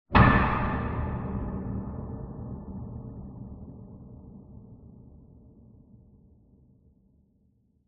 impacts hit metallic loud clunk reverb

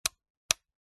household light switch wall mounted plastic click

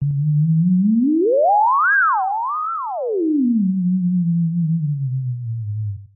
synth theremin robot wobble energy 50s; Jessey Drake

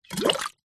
household water in metal pot bubbles blow short

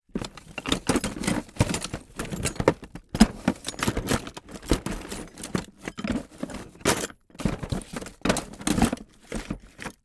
industrial toolbox full tools rummage

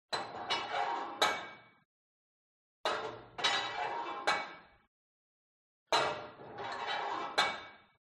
SPORT GYM Put Weight Onto Barbell Quick

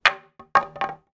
impact wood post drop concrete ground; zapsplat

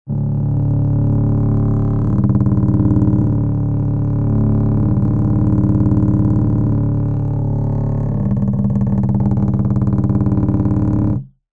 foley plastic hollow vibrate rattle

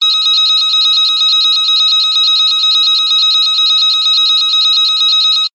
science fiction alarm fast high pitched harsh

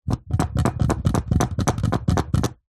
sport tennis balls in plastic tube shake; zapsplat

